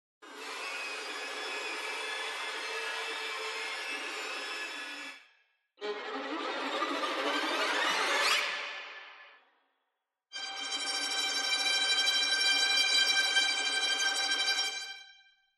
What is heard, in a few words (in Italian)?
horror tecnica estesa